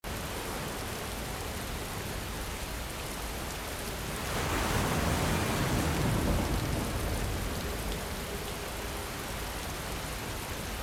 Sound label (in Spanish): lluvia relax